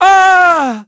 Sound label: fall; PHANTOM; Pulse Racer